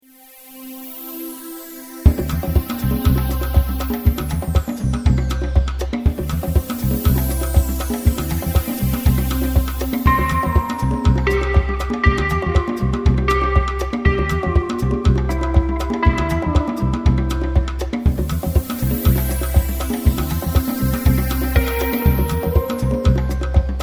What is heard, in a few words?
Dance Groove